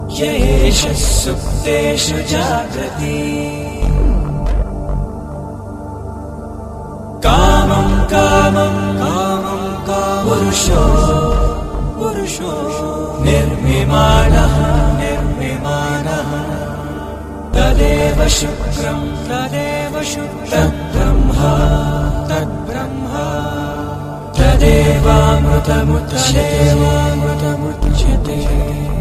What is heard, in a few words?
Mantra